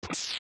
Steps Lava; zelda; OOT

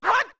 Attack; Zora Link's punching/kicking sounds, along with shouts

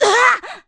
Knock Down; Zelda

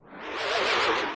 Choke; Mini Floormaster

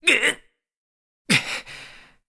Link Carry Stamina Very Low; zelda sounds; Skyward Sword

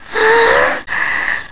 Deep Breath; Zelda taking a deep breath

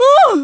Ohh; Sera: "Ohh!"

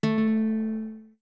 Notes Guitar A med; Zora guitar (medium)

